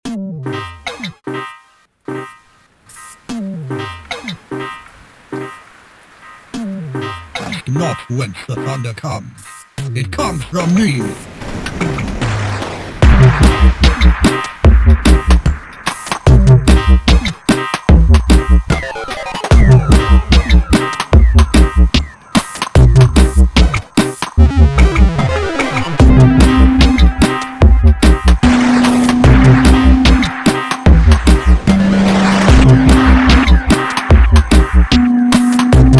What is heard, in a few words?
thunder dub